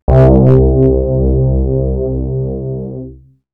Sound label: soft, Synth